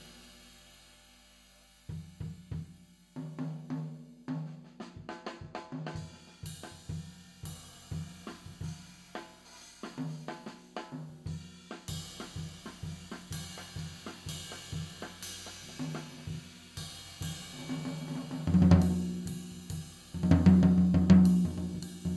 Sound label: Floor